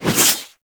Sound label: Warfan Whoosh; Warframe; Weapons; Melee